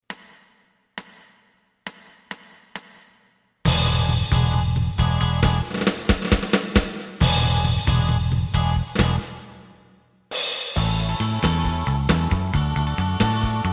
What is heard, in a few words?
lead